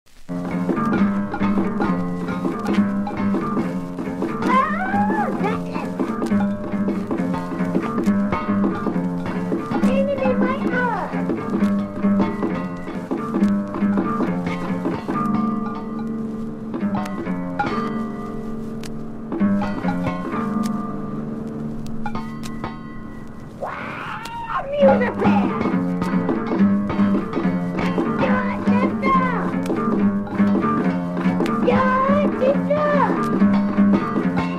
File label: Music Band